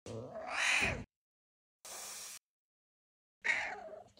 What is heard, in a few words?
serval